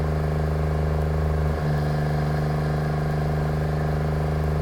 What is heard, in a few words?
ENTITIES CAR MID; SIMUZENSOUND